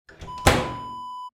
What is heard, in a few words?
washer door close